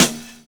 analog fi; Univers Sons - Kitch 70s

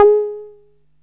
SOFT SEQ G#4, Alpha Dance; Best Service Alpha Dance vol.2; SOFT SEQ.2

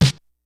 snares; Kid Nepro; Elite; KICKS SNARES

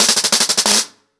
Snare 90BPM, ACOUSTIC DRUMS; Sonokinetic - The Roots Drumloops

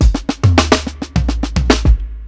105 FILL, DRUMS LOOPS; BASEMENT DRUMS VOL 2

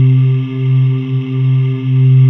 SYNVX; Greytsounds; Scott Peers Sound Engineering

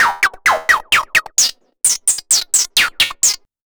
Android Arps 130BPM

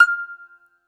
BAGLAMA F0VL; E-MU Producer Series Vol. 7 – Old World Instruments

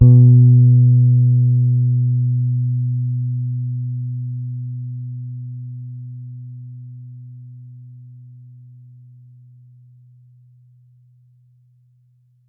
B3 soft, Pedulla Thunderbass, Bass; Giga Samples Collection

